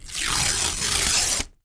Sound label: PAPER, TEARNG PAPER; AKAI S-Series CD-ROM Sound Library VOL-6